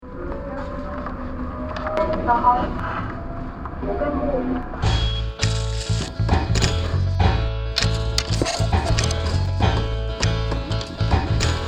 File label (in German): Karaokeversion; muggenhof